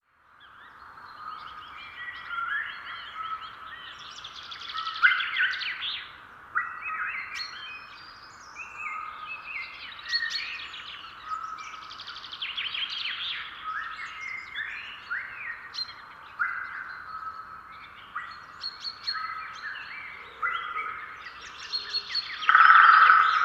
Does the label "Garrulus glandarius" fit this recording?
Yes